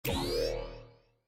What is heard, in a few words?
shield